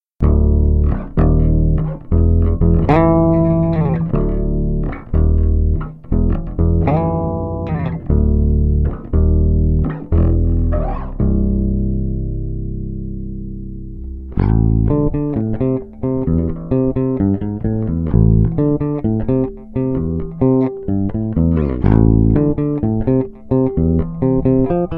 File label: bass sound sample bridge, bass guitar; sanjuro